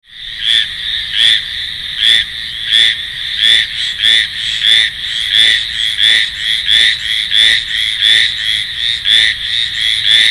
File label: haroweruamagaeru call, frog